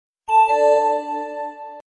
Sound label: small nice sms tone